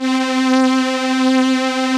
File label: vocoders; Sound & Vision - Gigapack I CD 2